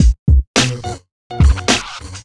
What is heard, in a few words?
Urban Beat, Drums